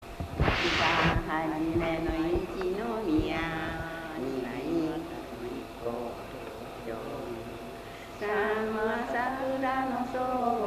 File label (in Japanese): itibanhajime, minyou; 一番初めに; 一宮町